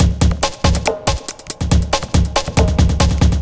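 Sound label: industrial 140 kit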